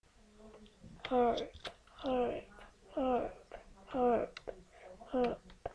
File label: harp